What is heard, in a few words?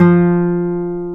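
classical guitar, nylon string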